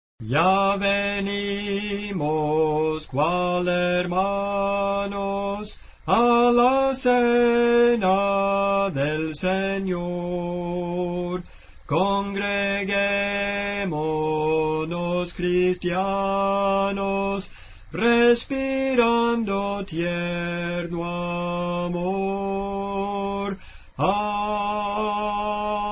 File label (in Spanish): Soprano, Cantos Espirituales; Soprano (melodía); 029 Ya Venimos, Cual Hermanos; Clase del Canto